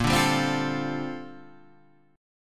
G Minor 11th A sharp

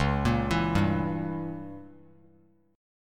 Db Diminished 7th Db down Bass Standard; arpeggiated; JGuitar

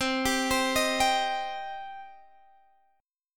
C Minor, C, Guitar, Half Step Down

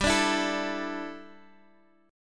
E 7th Suspended 4th G; strummed

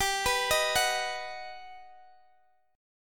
G Augmented Major 7th G, Guitar Open D; down

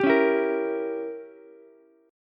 G Diminished 7th, Ukulele; strummed; 12,13,12,13; JGuitar

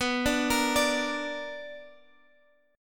B-Minor Major 7th B down Guitar; arpeggiated; 4E,4A,5D,5G,5C,6F

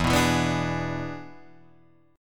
E 7th E down Guitar Drop D

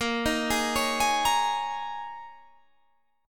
Ab Suspended 2nd Suspended 4th Bb

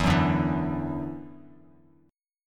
E 7th Suspended 2nd, down, Bass Standard; E7sus2; strummed

